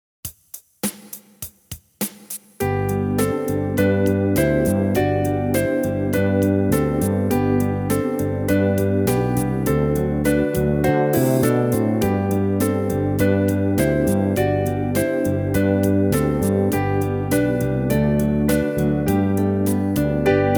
G Pentatscale Warm Ups Slow

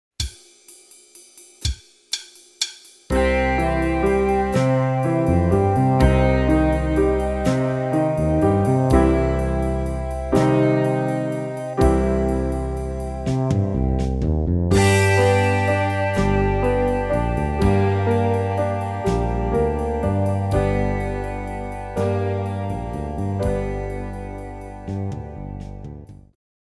Major And Minor Triads Slow

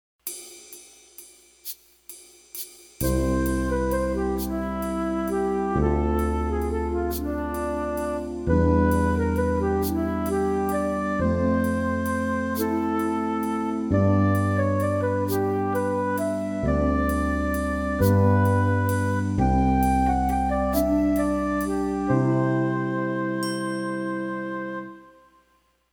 Pedal Study, piano

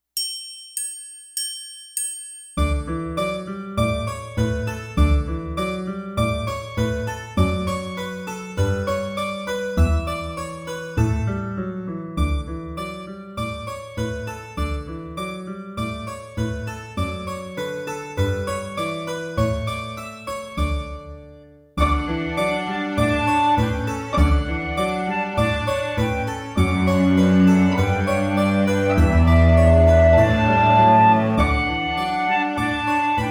Gavotte in D Major slow

